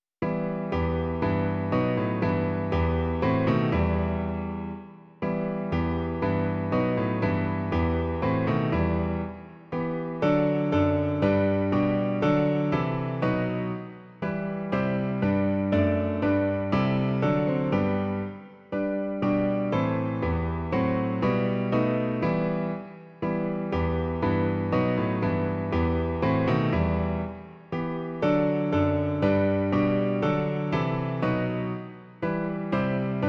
klavír; Ó Pane, zůstaň s námi jen; Klavírní verze

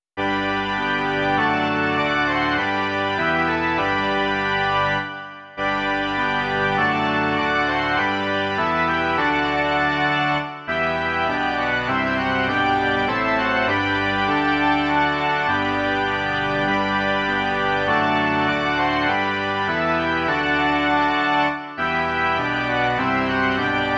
varhany; Již zpěv, prosby, kázání

